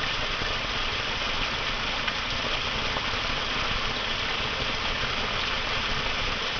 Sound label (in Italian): acqua water, natura